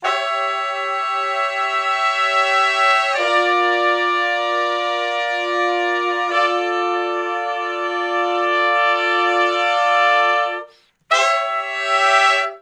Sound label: Slo Minor Blus Chords (V,IV,I,V) (D), Horncraft; Sonic Foundry (Sony Creative Software); Sections